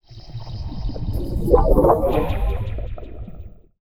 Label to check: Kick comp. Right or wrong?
Wrong